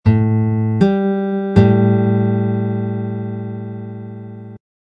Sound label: minor seventh